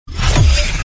传送门开

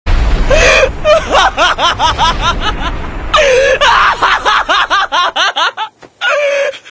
撕心裂肺的笑

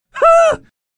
哼（瞧不起）

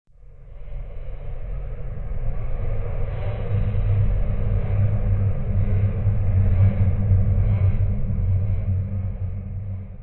惊悚诡异

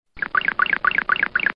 落荒而逃

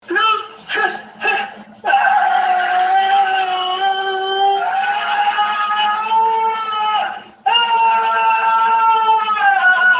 愣住-憨（长版）

